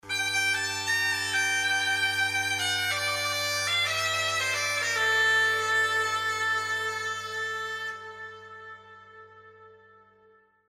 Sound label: bagpipe chant